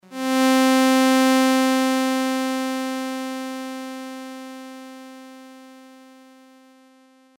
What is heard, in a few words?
adsr